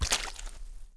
Animal Foot Large Mud; Civ4 warlords

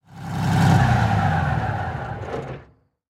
tank engine stop; War Thunder

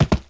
Horse Jump; Civ4 warlords

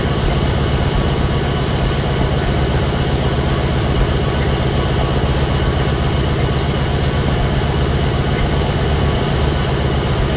sherman m4a1 engine rpm 66, tanks; War Thunder

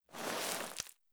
rock deploy; Rust

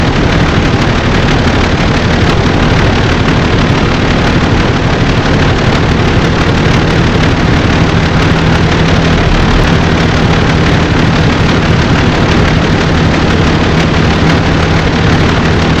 Vehicle Engines; Red Orchestra Ostfront 41-45

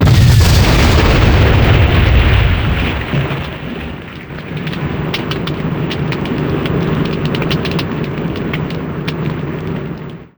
Explosions Explode Napalm; DCS

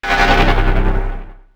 quad fire

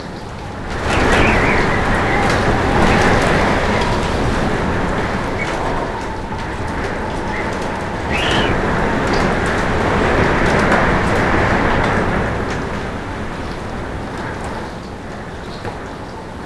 wind interior wood; Call of Duty Modern Warfare

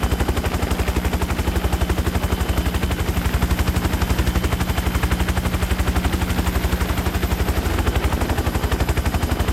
mi35m engine outside engine offload; engines; War Thunder

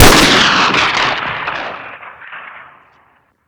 dragunov special, weapons; Modern Warfare

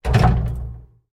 turret klank, tanks

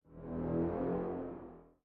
music interactive; World of Tanks

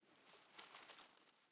leaf rustle twiggy soft

